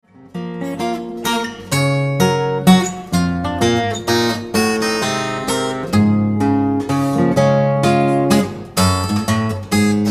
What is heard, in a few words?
Waltz